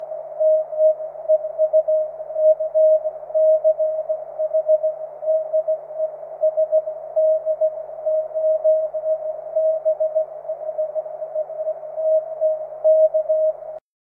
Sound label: cw